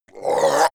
zombie grunt choke male short; kreaturen

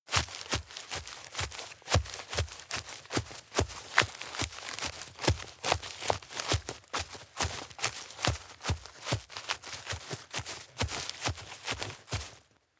step dirt and leaves heavy boots walk fast; schritte

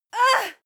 female moan pain short soft; soundpool

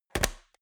metal box small close hard; soundpool

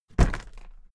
briefcase plastic drop soft; industrie wirtschaft; bueroarbeit

